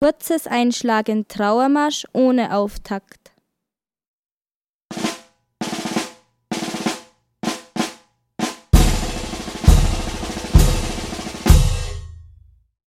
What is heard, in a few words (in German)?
Einschlagen kurz Trauermarsch ohne Auftakt; musik bewegung